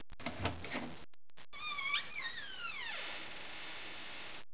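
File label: door squeak; 12000 Graphics Pack - A Complete Graphics Collection For Your PC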